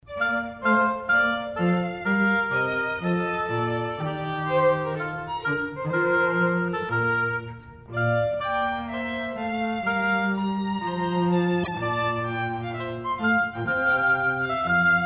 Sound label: Trio gavotte; Atrium Trio; corelli